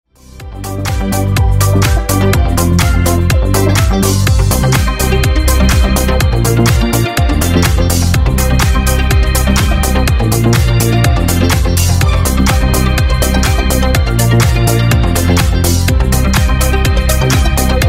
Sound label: Funky 124 Bpm